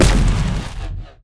shoot Smite; Mindustry

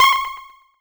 ping; Mindustry

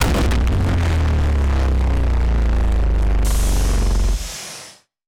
laser big; Mindustry

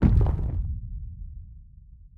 drill Impact; Drill/factory/generator noises; Mindustry

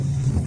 respawning; Mindustry

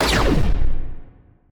railgun; Mindustry

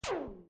laser shoot; Mindustry

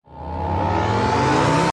laser charge; Mindustry

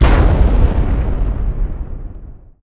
large Cannon; Mindustry

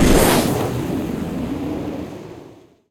missile Launch; Mindustry

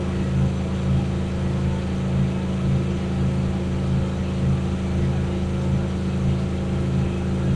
machine; Mindustry

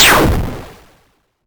laser shot; Mindustry

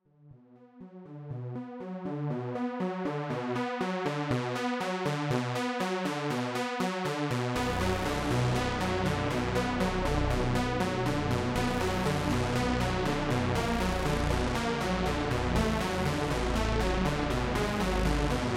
menu, music; Mindustry

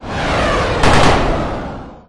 jet gun; attack sounds; Unciv

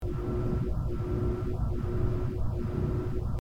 mine beam; Mindustry

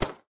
pew; Mindustry

